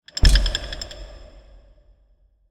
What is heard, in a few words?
trial omen; minecraft; mob effects